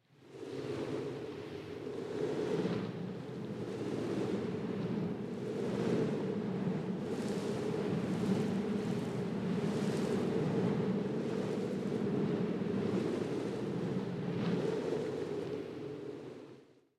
Wind Short Ambience, Some Small Rustling And Flying Objects Medium; Hollywood Edge; sound designer's toolkit v2